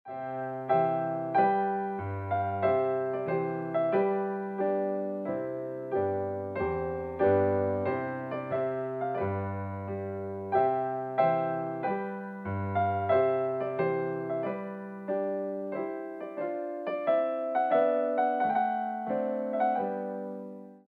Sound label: Trumpet Tune Slow; Purcell